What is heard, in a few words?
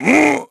GRUNT; VOICE; Pulse Racer